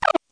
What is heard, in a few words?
bat hurt